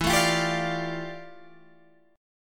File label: F Major 13th E, guitar chord